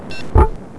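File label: close open; Mission 9 (Beta)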